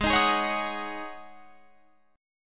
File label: G sharp 7th Suspended 4th G sharp, Guitar Open F; G#7sus4; 20,22,20,20,21,22; strummed